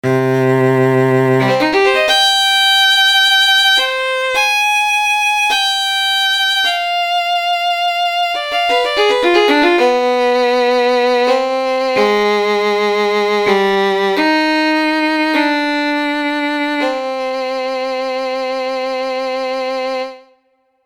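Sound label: strings quartet solo vibrato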